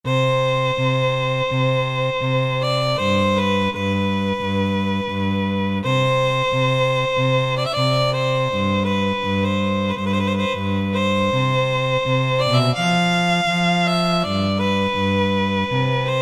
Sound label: VIOLIN & CELLO, Roland SC-880; Factory Patches